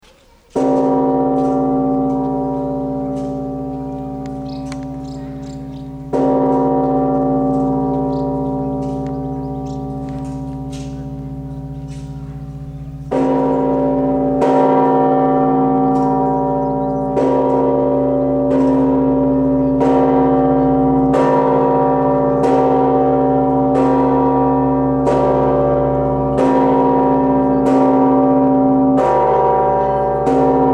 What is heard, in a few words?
solemn sound; Bulgarian Bells; St. Nativity of Jesus Christ, Shipka